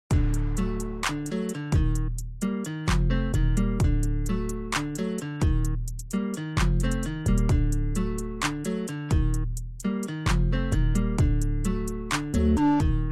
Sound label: remix